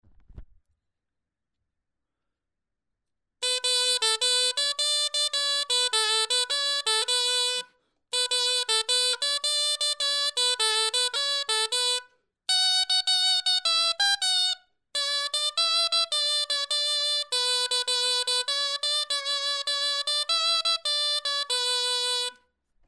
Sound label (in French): clarin; Eths Caulets; clari de bigorre